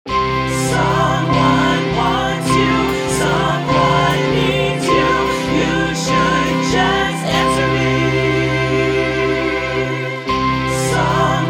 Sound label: ringtone